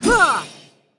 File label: Paladin F Cast